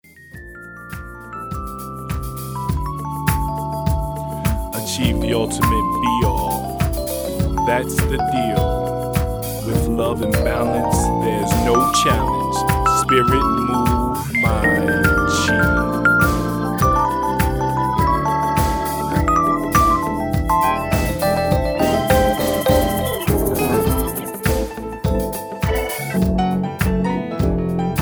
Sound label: Piano Solos